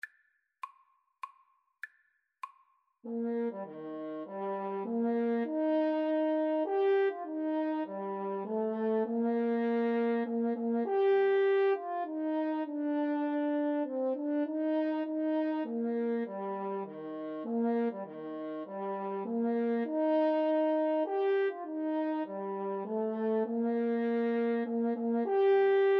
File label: star spangled banner, French Horn Duet